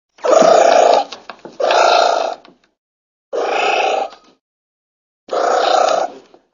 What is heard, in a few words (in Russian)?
murav, zvuk muravjeda